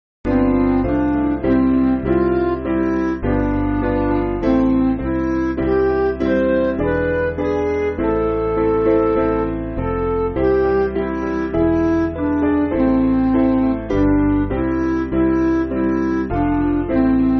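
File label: Midi, Music Backing Files for Churches; Descend O Holy Spirit; Ewing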